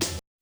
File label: snare, Hardcore Breakbeat; Big Fish Audio; Hardcore Breakbeat Action; Decimater